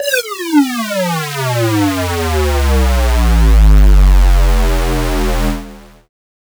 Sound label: FX DOWN; neuro; Stanza